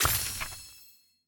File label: Collect Power; Boomerang Fu